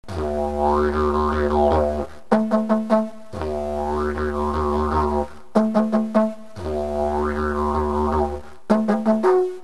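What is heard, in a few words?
trumpet hits, didgeridoo; Rhythmic Playing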